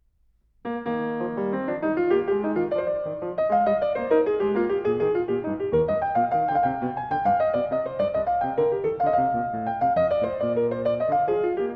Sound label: Courante